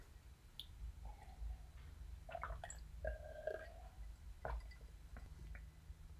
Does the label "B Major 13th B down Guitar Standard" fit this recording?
No